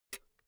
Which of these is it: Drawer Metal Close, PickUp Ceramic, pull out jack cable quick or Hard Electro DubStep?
pull out jack cable quick